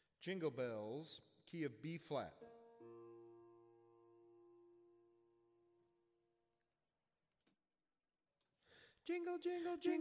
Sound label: Jingle Bells bass